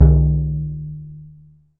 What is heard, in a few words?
EDGE TONE, Surdo